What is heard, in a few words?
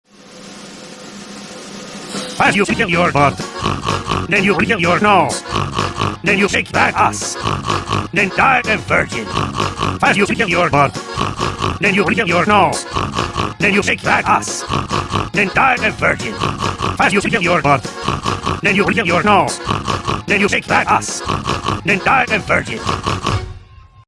SNORT SNORT SNORT